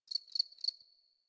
Eidolon Insect Day; Ambience; Landscape; Warframe; Eidolon Plains; One Offs; Insects

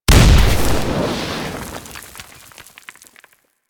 Inferno Comet Hit; Warframe; Ember

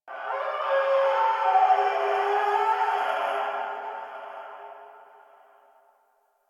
Covered Vine Area Tube Scream; Ambience; Misc Outdoor; Warframe; Infested Eris

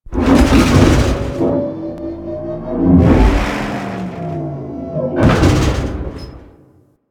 Ship External Machinery; Ambience; Warframe; Corpus Ship Remaster

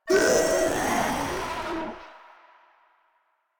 Proto Infested Runner Death; Warframe

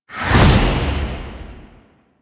Sonic Dissipation; Warframe; Focus; Vazarin

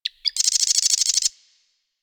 Forest Bird Squak; Warframe; Ambience; Grineer Forest

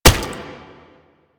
Step; Warframe; Proto Infested Brachiolyst

